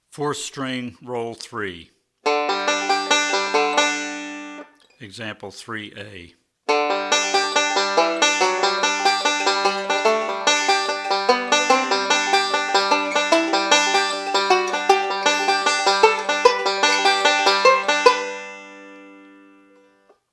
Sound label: Rolls For Melody On 4th String